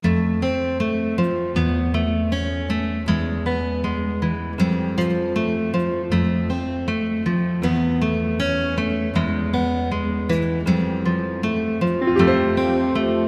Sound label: dusk forest dark and atmospheric music